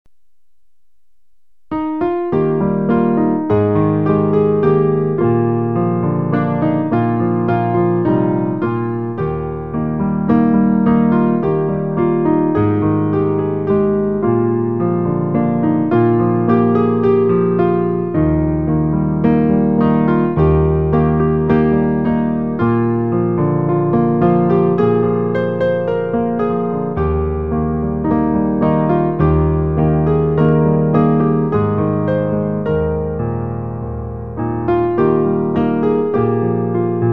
piano

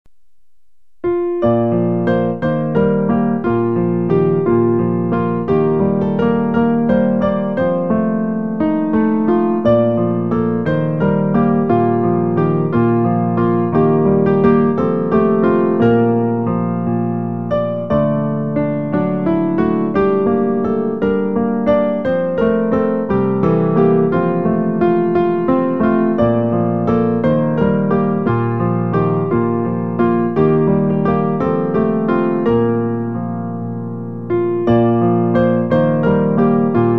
voici l'enfant piano; recueil Louez Dieu

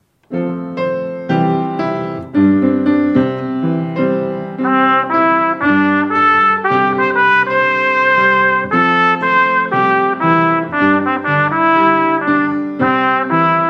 trompette; recueil Louez Dieu

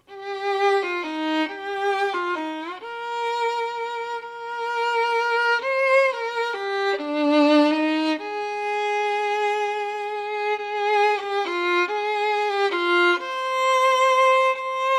violon

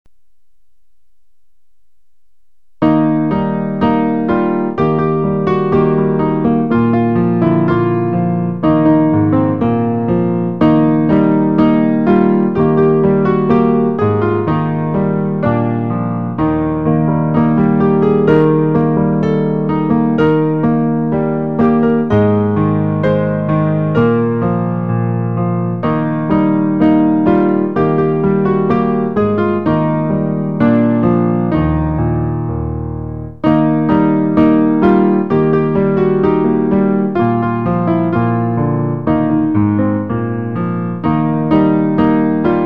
lis ta bible piano; recueil Louez Dieu

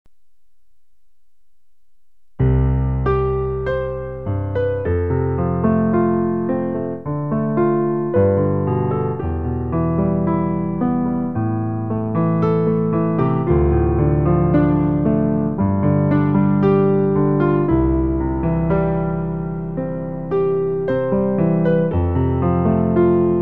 piano; recueil Louez Dieu

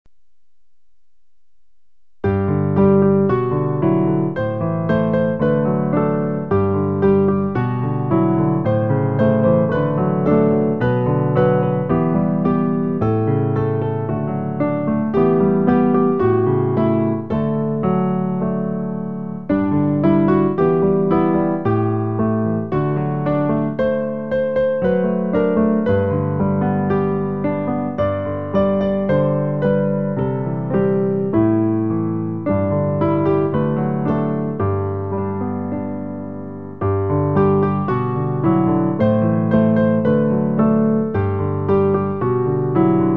piano

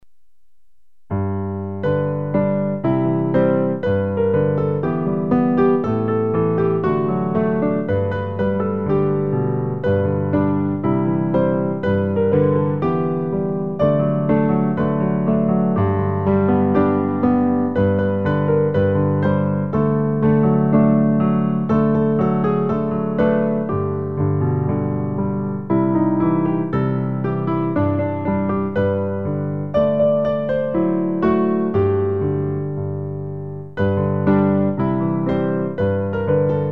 piano

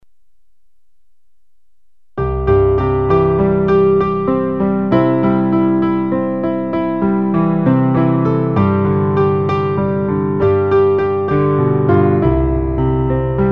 piano

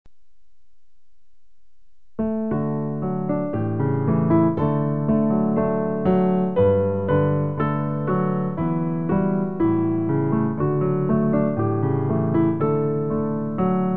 piano; Merci, merci Seigneur; Pour accompagner le recueil Louez Dieu.

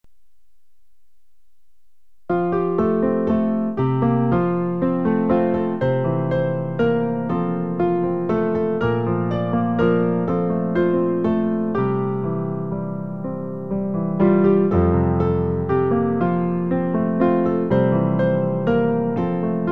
croire obeir piano; recueil Louez Dieu

